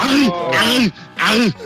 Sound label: aaaarrrrrrgggghhh